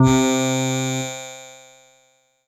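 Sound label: E-Mu Proteus FX Digital Piano C3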